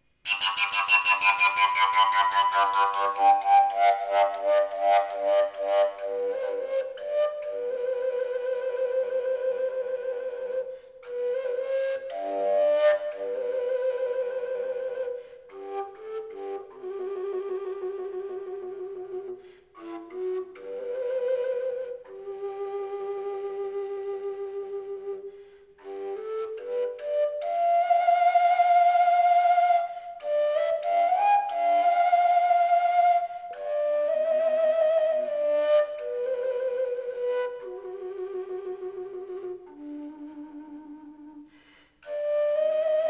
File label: fuyara